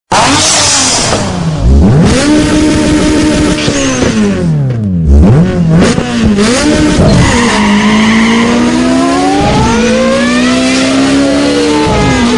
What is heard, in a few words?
Car Sounds Ringtone